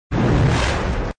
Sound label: Select Fire